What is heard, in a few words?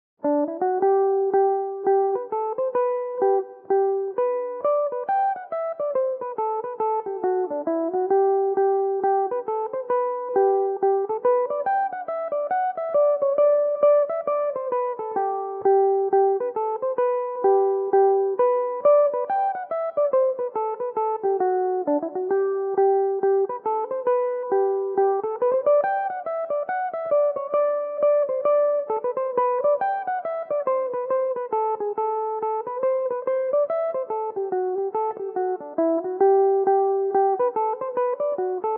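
hornpipe G major, Irish mandolin; Mister Walker's Hornpipe; Belmuse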